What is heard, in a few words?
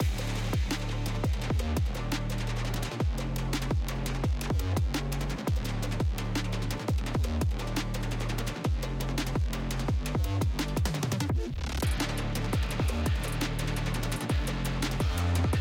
mus arena